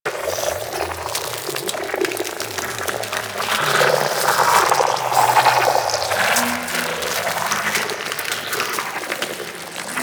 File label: Molt Loop; Warframe; Saryn